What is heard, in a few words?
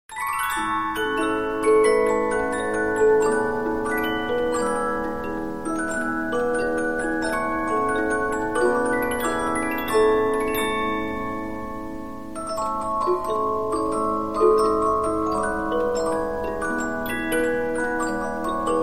Polyphon, 15inch disc musicbox